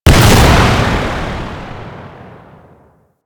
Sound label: Bow Second Explosion, Weapons; Warframe; Corpus